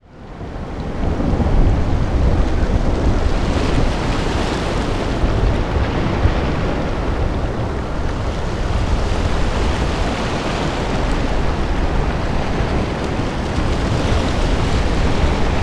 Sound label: Weather Ambience Storm Sea Thunder Waves Ocean Wind